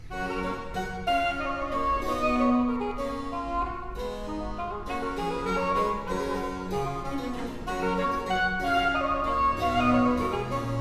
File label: Sonata for Oboe and Basso Continuo in G major Minuet; Giovanni Battista Sammartini